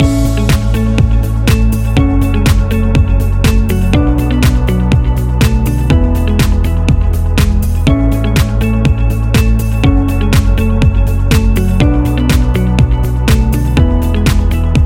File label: Business Loop